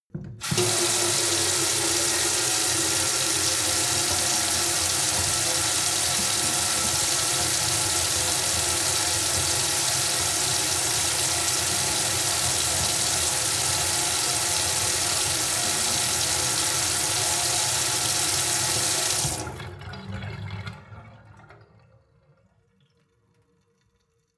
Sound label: sink water flow hard long; industrie-wirtschaft; gastronomie